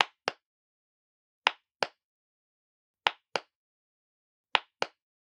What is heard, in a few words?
Generic Button Plastic Small